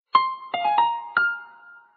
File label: sms notification